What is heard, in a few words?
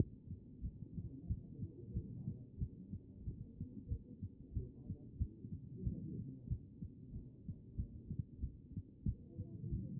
HEART B MODE; cardiac